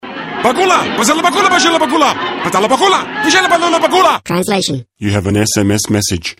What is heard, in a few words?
Tribal sms